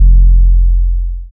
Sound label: 808 f soft